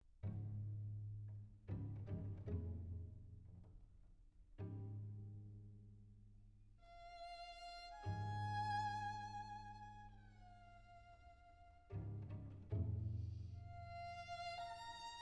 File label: Quintet for Clarinet and String Quartet; in A Major K 581 IV Allegretto con variazioni